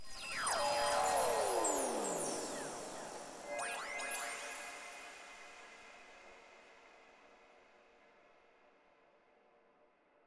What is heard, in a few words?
logo sound